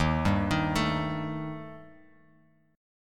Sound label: D sharp Major Flat 5th Bass Standard; arpeggiated; D#Mb5; 11,10,13,14; JGuitar